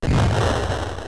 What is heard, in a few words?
boom; carnage